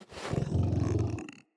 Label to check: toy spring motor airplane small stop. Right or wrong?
Wrong